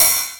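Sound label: CLUB ride, CLUB KIT; Wizoo - Platinum 24 Electronic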